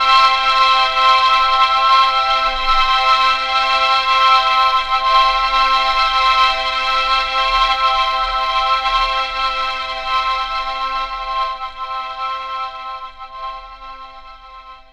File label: SYN CHD; E-Lab; X Static Goldmine 2; akai